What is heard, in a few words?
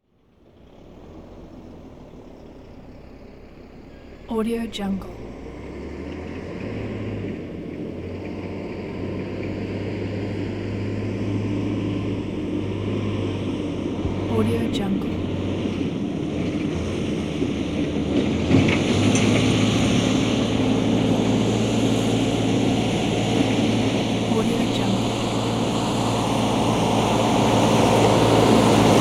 truck with slow rattle drive by; hollywoodedge